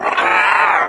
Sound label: chatter combat, revanent; doom3